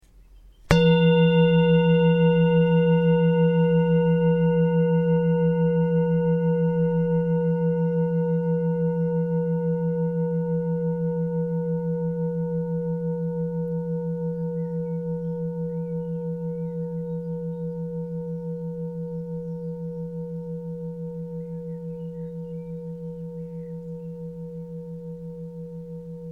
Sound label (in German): Klangschale